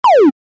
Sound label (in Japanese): 撃つ レトロ; モノラル